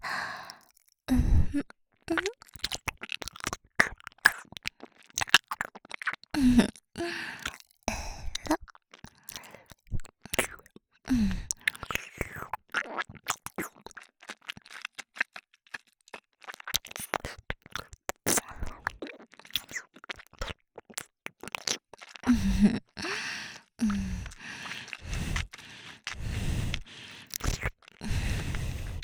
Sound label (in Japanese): 耳元唾液ぐちゅぐちゅ; ランカのみ; 本編抜粋